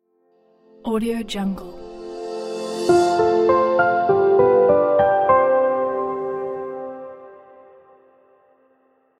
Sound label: opening piano logo